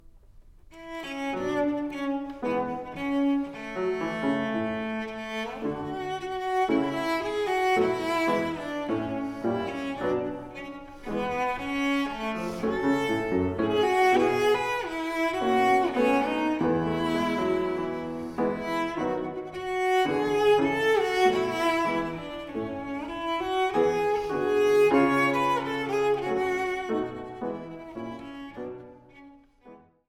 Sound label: Variations pour le violoncelle sur un theme Rococo